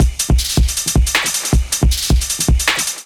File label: Kit 157 bpm, Drums; MIDI Clips; Pico Dilly Kit; Ableton; Factory Packs; Skitter and Step